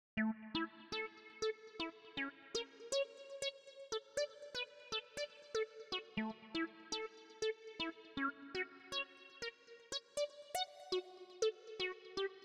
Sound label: Harmony Synth